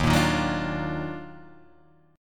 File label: D sharp Minor Major 9th D sharp down